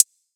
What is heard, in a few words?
hi hat b key; Mono; drum single hits one shots